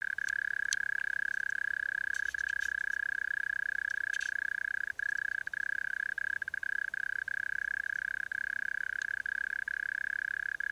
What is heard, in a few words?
Mole Cricket well established pm